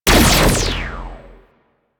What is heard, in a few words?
Flux Rifle Shot; Crp Laser Rifle; BE Flux Rifle